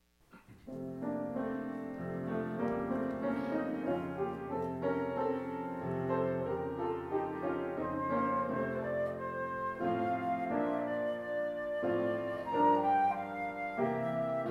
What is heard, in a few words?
flute; my faith has found a resting place